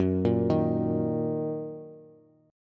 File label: Gb 6th Gb down Bass; Gb6 arpeggiated; JGuitar